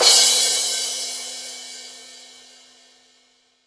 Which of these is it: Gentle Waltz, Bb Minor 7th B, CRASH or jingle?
CRASH